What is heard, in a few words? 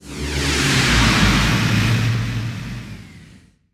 Spaceship Takeoff Tail